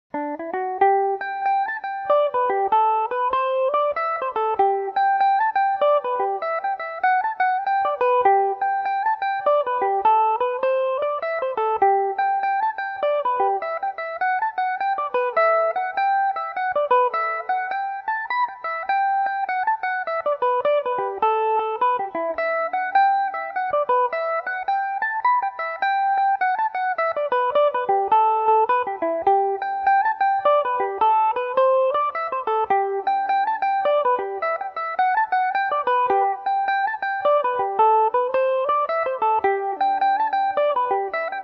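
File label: G major, irish mandolin; humours of kilkenny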